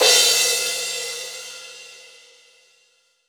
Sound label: Crash, Cymbals; Roland BK-5